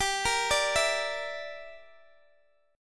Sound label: G Minor 6th, Guitar Open D